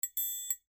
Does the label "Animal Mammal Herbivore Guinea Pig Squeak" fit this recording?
No